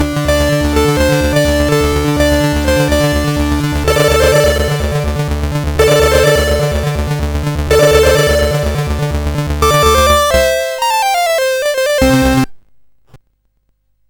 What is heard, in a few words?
sid CSG8580R5, GAMES; Continental Circus